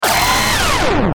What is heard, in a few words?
Stinger Robot Ascend Bend; Production Element Imaging Element Accent Transition